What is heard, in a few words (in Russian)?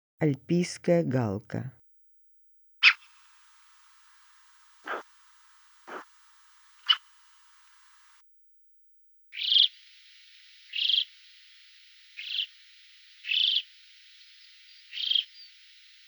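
alpiyskaya galka golos, alpine chough voice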